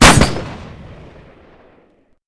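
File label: grenade rifle; WAR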